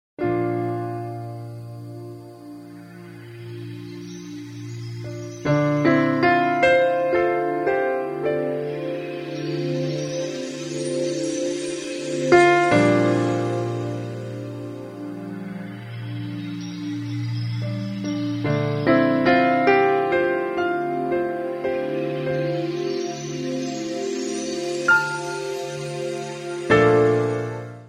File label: piano